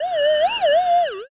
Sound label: ghost